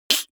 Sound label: human mouth beatbox snare clap; smartsoundfx